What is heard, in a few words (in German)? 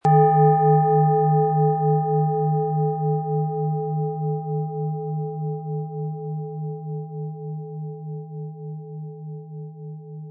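Planetenschale Eros inkl. Klöppel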